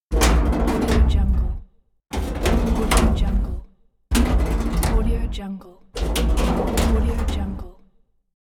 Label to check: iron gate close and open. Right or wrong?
Right